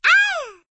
victory; tera; elin

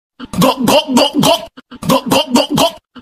gawk gawk gawk gawk

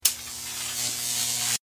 electricity

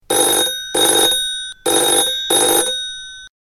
telephone

